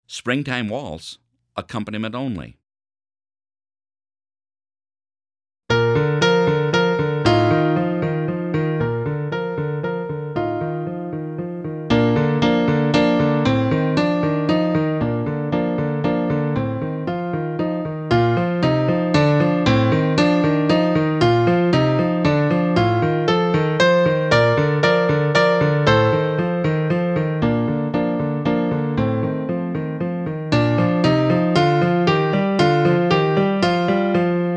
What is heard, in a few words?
springtime waltz accompaniment only